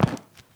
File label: concrete, footsteps; Sourceforts2.0